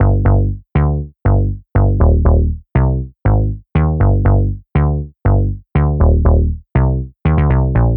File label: Pop Future Pop